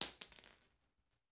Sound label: Bullet Shell; Sound Ideas; Guns